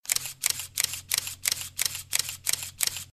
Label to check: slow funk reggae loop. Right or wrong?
Wrong